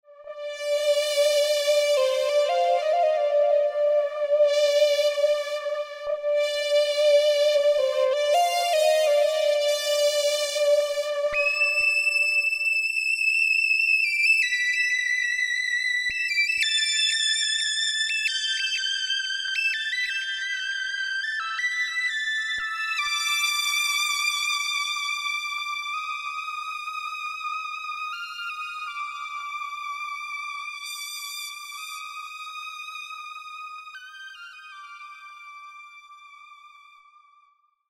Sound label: XV 5080 synth; ROLAND XV5080